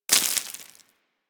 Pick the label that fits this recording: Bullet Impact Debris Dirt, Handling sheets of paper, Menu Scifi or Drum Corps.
Bullet Impact Debris Dirt